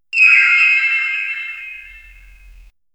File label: light halo appear, magical